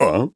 Rough Landing Awaken; Groose; Skyward Sword

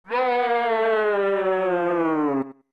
Four Giants Shout

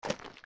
steps; Jabu Jabu; zelda; OOT

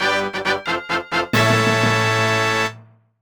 Fanfare Get Big Gold Rupee, gameplay sounds; Phantom Hourglass: "Get Big Gold Rupee" fanfare

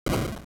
Bomb Blow, Bomb exploding; LOZ

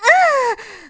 Tetra Struggle; Tetra struggling in the tree

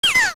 Deku Scrub Die; zelda; OOT

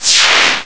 Ice Melt; Ice melting

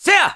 Jump Attack; Link's jumping attack

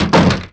Shelf Knock

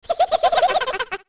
Ocarina Laugh

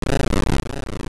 Dragon Swipe; Onox [Dragon form] swiping with a hand, or shooting blue fire (very similar to the Dodongo Roar sound); Zelda Oracle

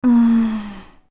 Sigh; Rosa Sisters thinking/sighing

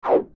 Roll; Link rolling

